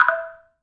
Ui Cancel; The Croods

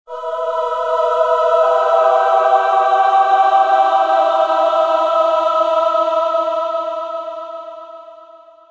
Choir; Forest

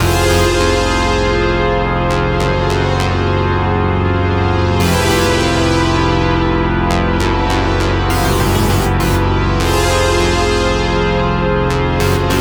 Organ Scheming Loop

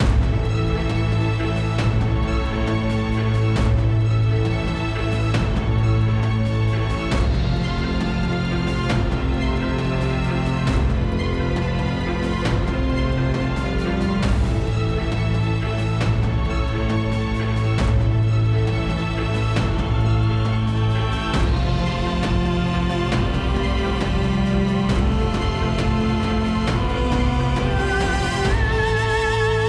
Sting Landing Positive

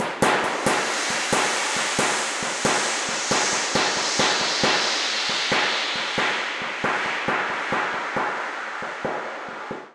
Cave Tsheetgroove

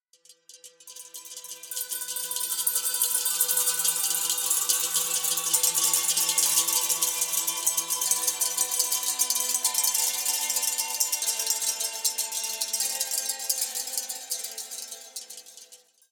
Forest Dulcimer

